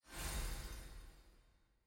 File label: sfx ui mission pane restore hover; tft